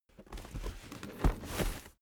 cardboard box big put down

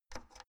oven knob turn soft squeaky

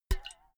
filled can hit bounce ringing dripping

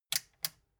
metal button click power on slow

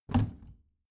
plastic trash can open heavy

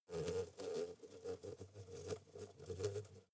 hornet hum soft

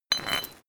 potion small pickup

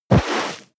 body fall leather snow

